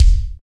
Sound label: SPD-8, digital; 90 s Sample CDs; Sound & Vision - Gigapack I CD 1 (Roland)